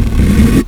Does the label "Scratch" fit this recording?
Yes